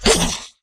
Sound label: spell; RPG